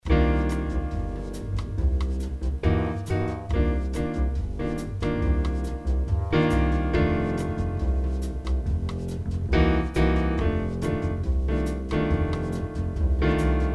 Cycle 5 2-5, Cycle 5 2-5 patterns

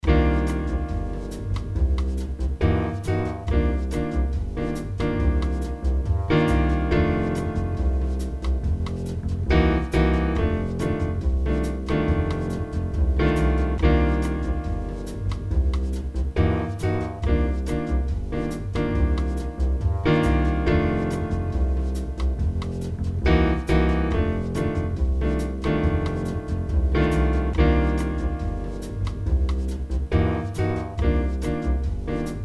2-5 Pattern, II-7 V7 pattern

